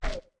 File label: Zora Link Step; Zora Link walking (same sound in 3 pitches)